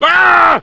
pain; svencoop; st allies; lowell

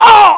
pain; svencoop; scshogo; fallenofficer

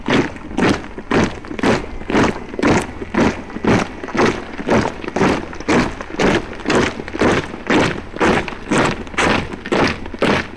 buzzer marching; svencoop; sence; mistake coop

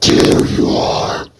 alert; svencoop; custom monsters; overlordwizard

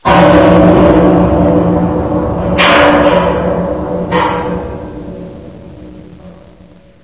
buzzer; svencoop; sence; mistake coop

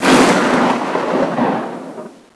thunder attack; monsters; svencoop; AoMDC; davidbad

